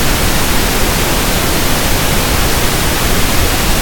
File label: simulated Pink Noise